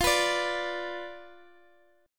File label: B Diminished F